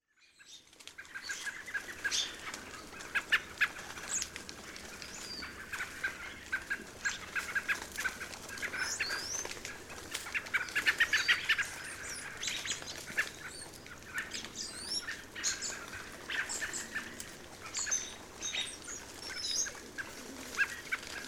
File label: American Robin